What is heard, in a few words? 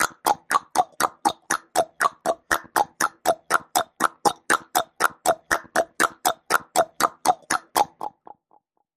Vocals Fast Click Rhythm